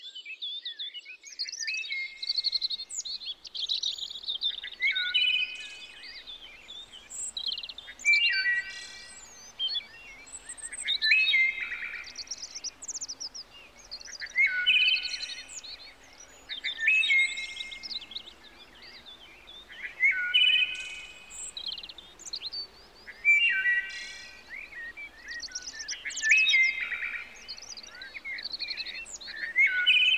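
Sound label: wood thrush; drevesnii drozd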